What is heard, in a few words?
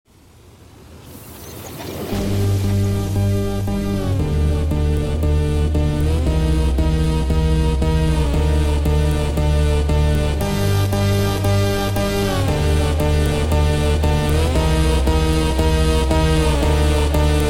Funk slowed